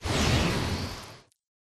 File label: atlas dash slide; Titanfall 2